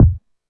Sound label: Kick, Yamaha DD-20